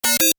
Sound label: Tech beeps, Beeps, High Tech; Stuart Duffield